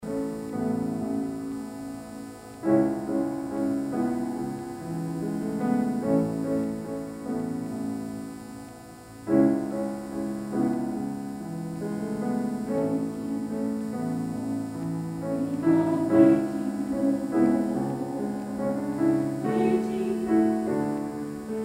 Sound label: Choir; taiwanese